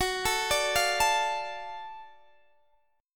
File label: Gb Minor Major 7th Sharp 5th Gb, down, Guitar, Open D